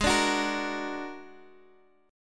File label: A sharp Augmented 7th, chord; x,x,6,7,7,6